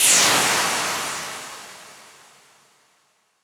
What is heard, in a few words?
NOISE